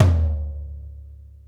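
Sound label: floor, Drum Kits; Sonic Reality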